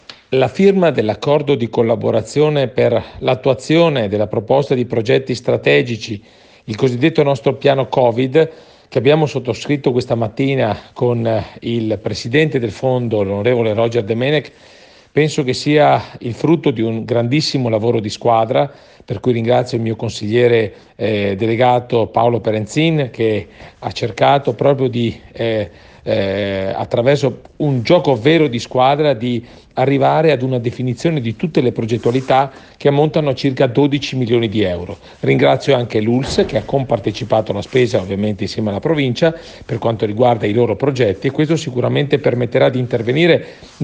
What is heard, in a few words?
PIANO